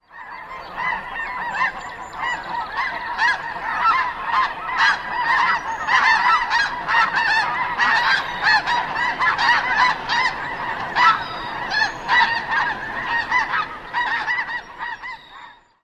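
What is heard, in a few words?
snow goose large flock flying over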